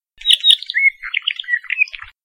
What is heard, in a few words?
apapane call